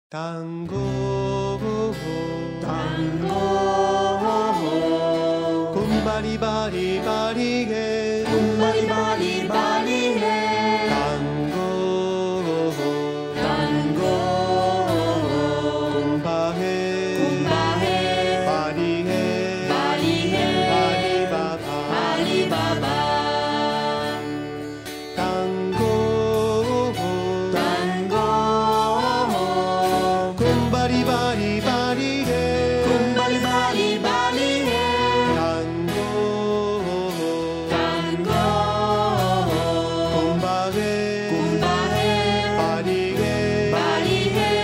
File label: Tango